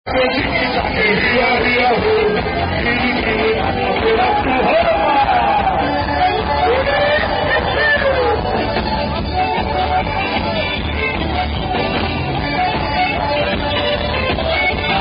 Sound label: Ziggy Zaggy Oy Oy Oy Commerical Song In German; Zicke Zacke